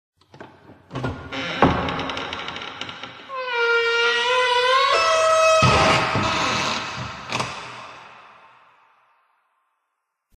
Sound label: The Creaking Door